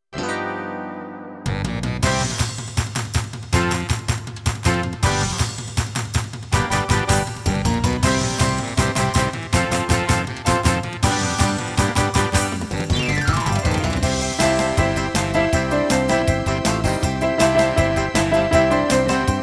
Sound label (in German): Klavier